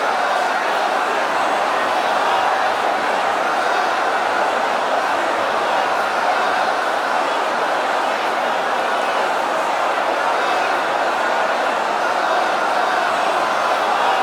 Crowd Excitement; Lunaro

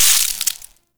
Electricity Trap Loop Stop; Venus; Kahl; Warframe; Veilbreaker

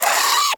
Vocal Attack, Hunting Critters; Nexifera

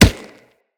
Kubrow Run Dirt, Footsteps

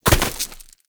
Bird Of Prey Bodyfall Dirt; Hunting Critters

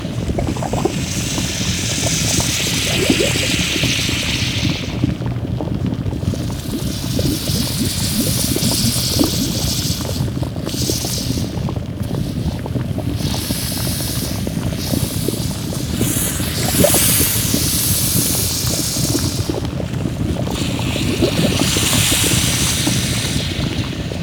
Water Steam Bubble Loop; Ambience; Props; Warframe; Eidolon Plains

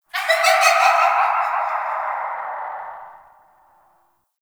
Canvas Laughs; Shadowgrapher

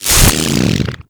Arc Rifle Fire Stop; Infested

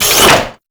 Drone Face Shield Close; Warframe

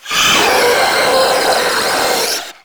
Proto Infested Ancient Heavy Bark, Enemies Vocals; Warframe

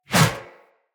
Sphere Board Whoosh; Weapons; Swords; Sphere Sword And Board

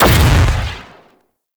Pistol Explosive Impact; Warframe; Weapons; Sapient Pistol

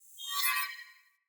Sword Whoosh Accent Heavy; Fey Sword

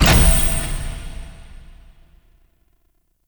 stairs; Vaults Stairs; Gameplay; Warframe; Entrati

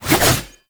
Staff Swing Spin Sweetener; Warframe

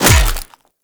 Melee Hammer Impact Flesh; Hammers

